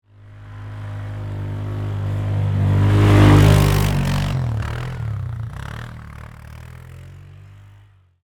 ATV Pass By, Sports, Atv, Pass, By, Close, Fast, Speed, Polaris, 500Cc, Four, Wheeler; BlastWave